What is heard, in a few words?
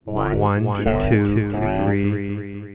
mixer